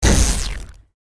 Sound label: impact; cstrike; war3source; manashield